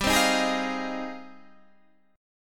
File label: G sharp Major 13th